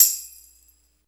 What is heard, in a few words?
TAMBURIN; drum; PERCUSSION